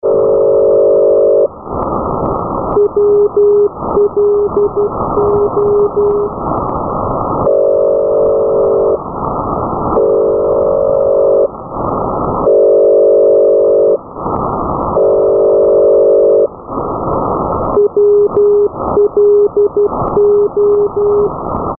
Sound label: Mystery 8420 khz; ham